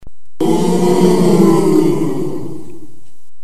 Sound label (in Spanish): abucheo